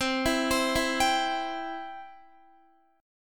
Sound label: C Major, Guitar; arpeggiated; x,15,16,17,16,15